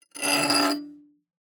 blacksmith, forge, handgrinder, blade, gritty, scrape, slow, shudder, buzz, wide; Ilmarinen